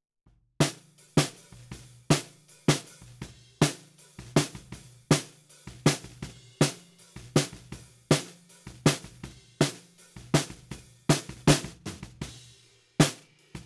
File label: Snare Bottom, 160 BPM